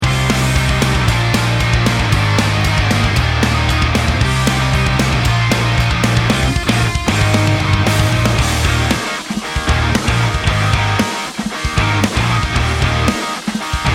start action

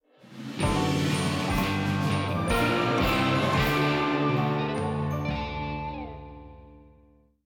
Jingle Card Evolution

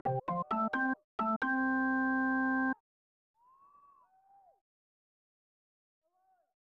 Organ Music; Troy Aikman NFL Football; SNES

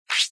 attack

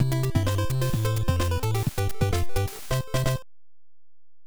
Area Clear; Bio Miracle Bokutte Upa; Family Computer

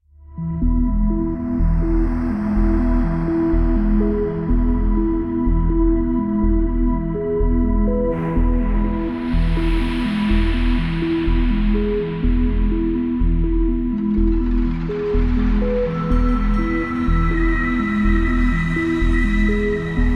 Cave Exploration; Fortnite Save the World

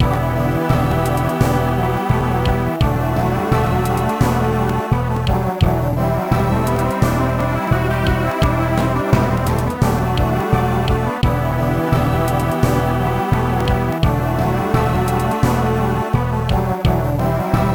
Character Ending; Dragon Ball Z Shin Butouden; Saturn

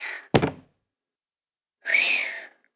Jump Down; talking tom cat 2 android ios online gamerip 2011

